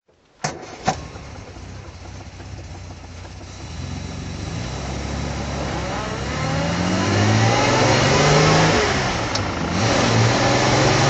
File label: austin healey 3000 mk2 drive